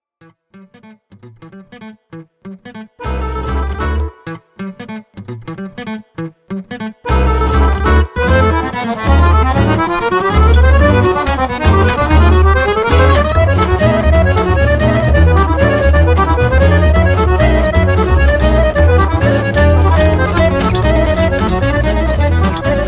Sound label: Rhythm Cocktail